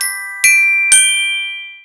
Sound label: RHYTHMICAL WAVE